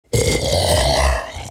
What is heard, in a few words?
Male Zombie; Project Zomboid